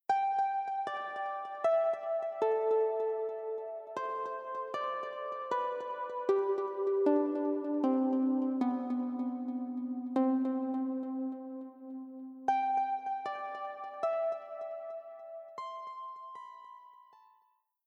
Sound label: Reverb Delay Celesta Delay On; Mix Insert